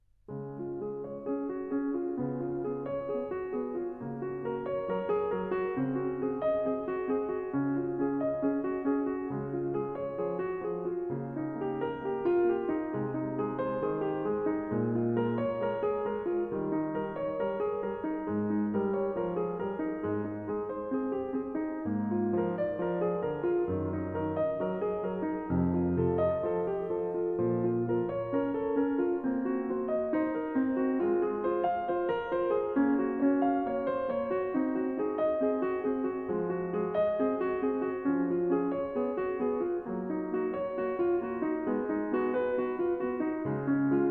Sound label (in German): Praeludium Cis-Dur; Bach; Das Wohltemperierte Clavier; Andras Schiff; ECM; BWV 872